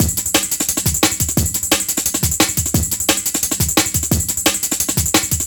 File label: A, 175, classic dnb breaks